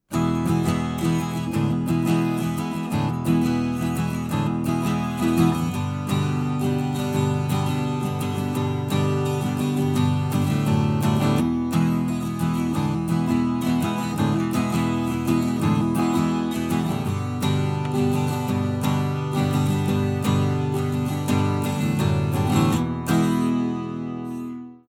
Guit, Kugel, Preamp; Orpheus

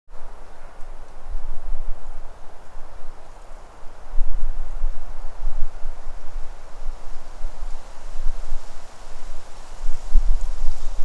Natur

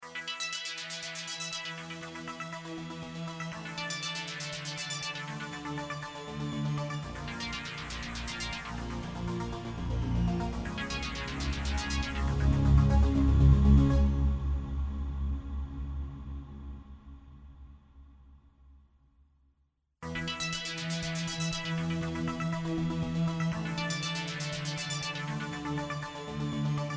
Keys Unteres Band Boost Und Cut

